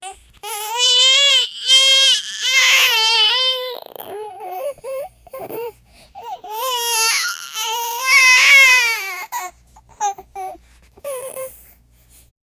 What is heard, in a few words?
eerie hospital themed bab, birth